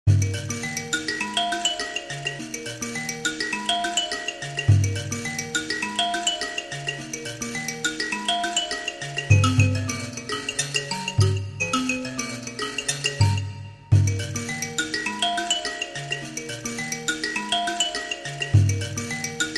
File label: Mbira